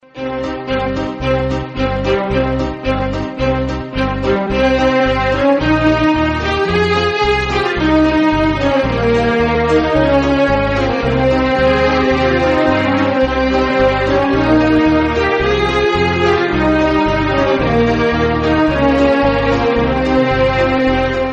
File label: Indian loud ring ringtone